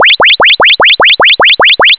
warning, sirens

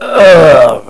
ugh

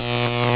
electric arc

